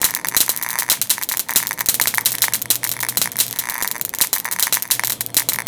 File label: Sparks; Warframe